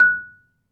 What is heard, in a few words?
MARIMBA; ILIO